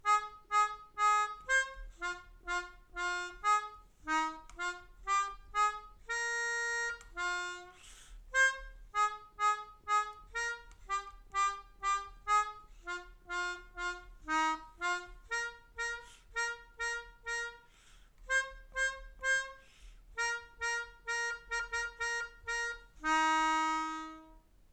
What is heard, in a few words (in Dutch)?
Zag 2 beren broodjes smeren, Melodica